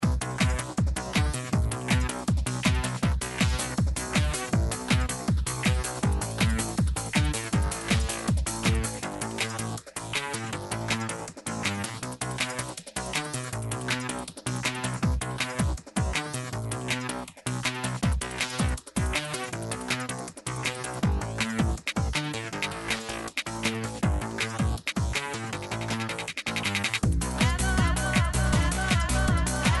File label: Piano